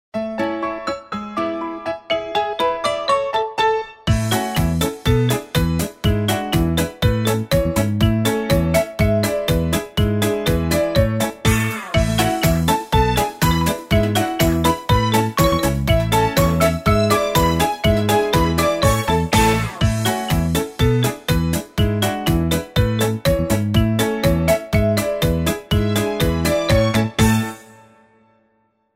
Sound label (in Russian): polka